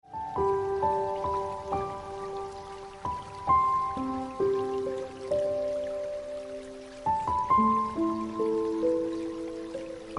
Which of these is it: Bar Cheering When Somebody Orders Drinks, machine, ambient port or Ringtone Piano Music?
Ringtone Piano Music